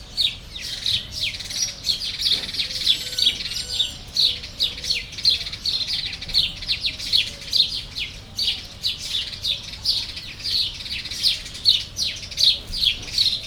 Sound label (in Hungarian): csiripelő verebek, házi veréb; jaszberenyi zoo 2015